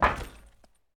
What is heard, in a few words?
metal footstep